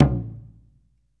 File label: OKEDO, Ritual Drums; E-MU Producer Series Vol. 7 – Old World Instruments